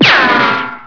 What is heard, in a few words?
Ricochet